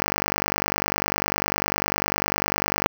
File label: 125bpm 5-4 1bar; MidroSync